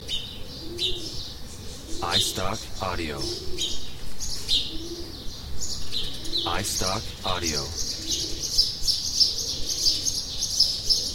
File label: bird tweets